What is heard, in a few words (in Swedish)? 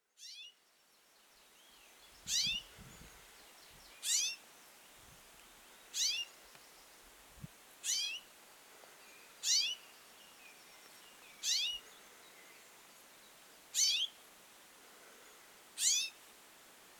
rosenfink; fågelljud